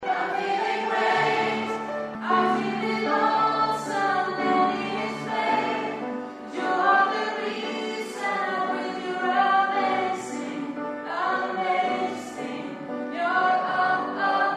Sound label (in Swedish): bas